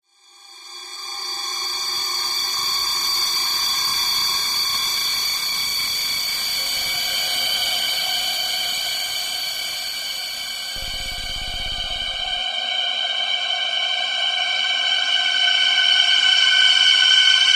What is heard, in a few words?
Cold Rising Shrills with Sweeps Shrills, Sweeps, Cold; alien